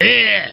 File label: grunt; Krunch